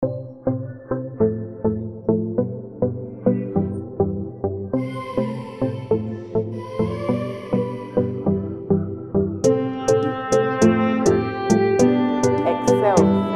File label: Instrumental